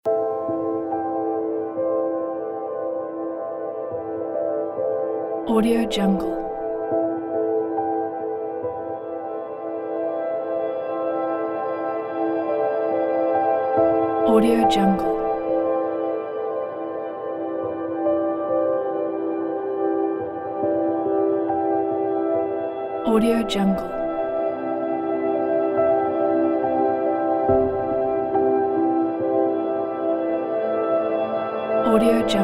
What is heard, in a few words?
atmospheric soundscapes